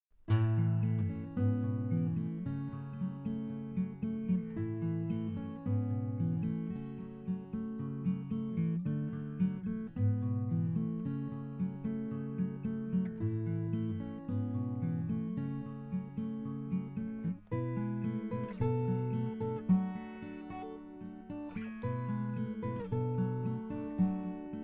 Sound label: picking; Soyuz SU-019